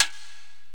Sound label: Korg x5, Drums